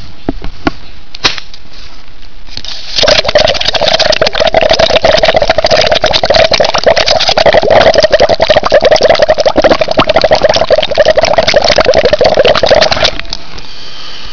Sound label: hit